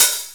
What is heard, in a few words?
909 HITS; Megabass Remix! 2